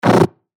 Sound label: percussion C#; revealed percussion vol 1